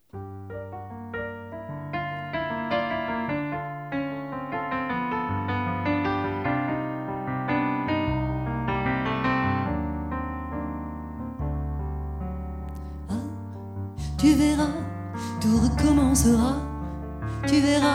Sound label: piano